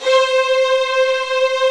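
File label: strings, Vlns 8 fff Agitato; Roland L-CD702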